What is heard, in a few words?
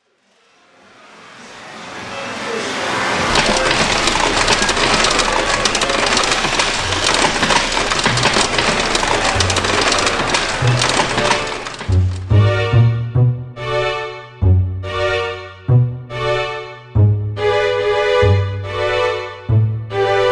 pachi waltz inst